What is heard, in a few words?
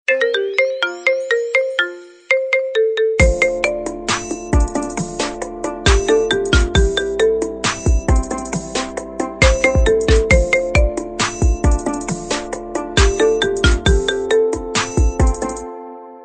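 iPhone 14 Volume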